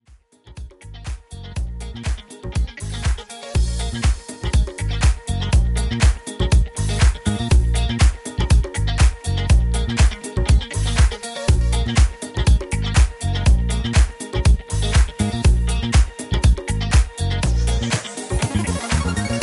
disco house hip hop Funky Remix

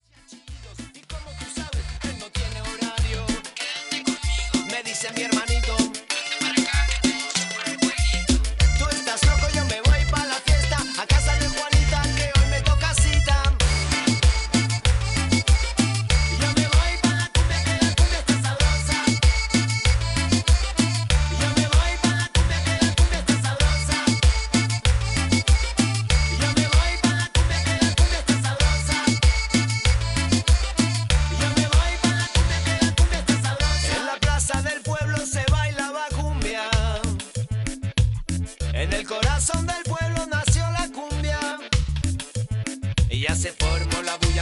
Cumbia

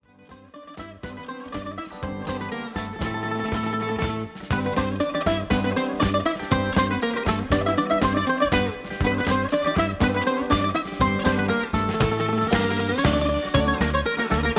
INSTRUMENTAL BOUZOUKI

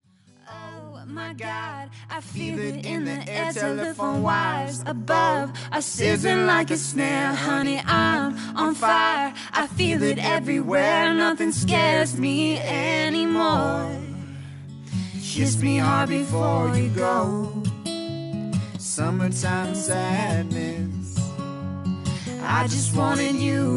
Acoustic Cover

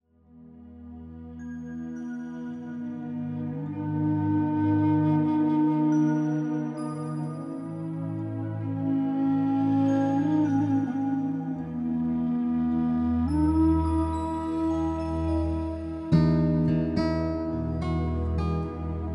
Gratitude at 432 Hz

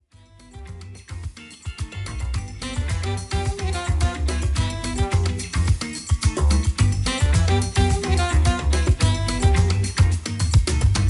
Brasil Bossa Party Extended Mix

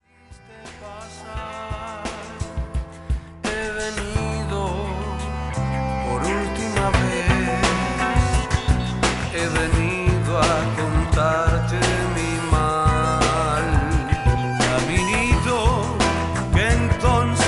Tango Lounge

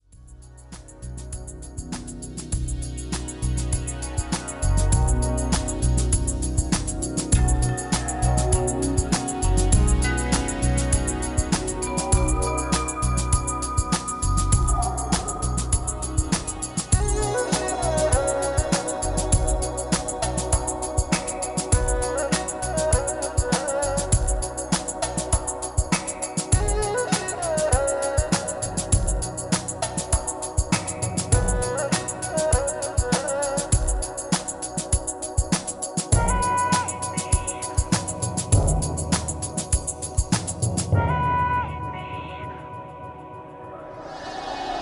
Asian Chill Lounge

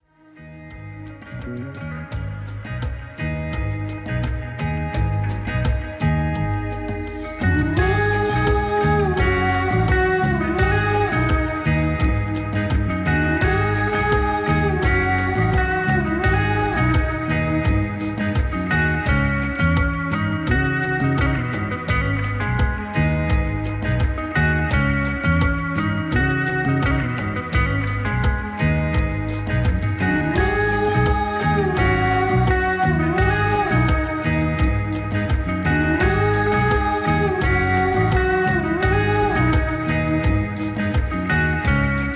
Cumbia

